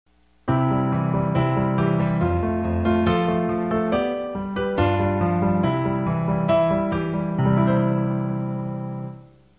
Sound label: harmony piano broken chords